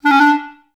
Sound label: D3, Advanced Orchestra; Best Service